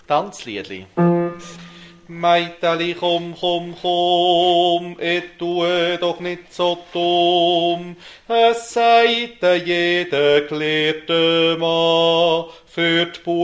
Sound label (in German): Bass